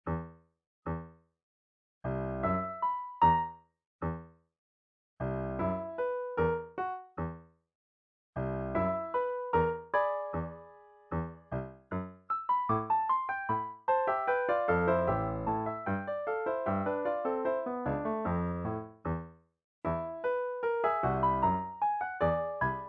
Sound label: Latin Tracks